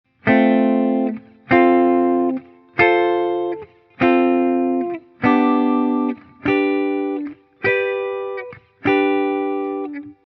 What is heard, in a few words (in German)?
F Dur G Dur